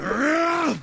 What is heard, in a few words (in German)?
schlag heftig; mount blade